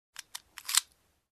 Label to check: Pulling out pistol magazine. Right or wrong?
Right